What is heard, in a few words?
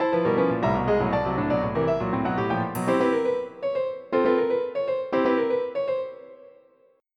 carol; EuroCD 3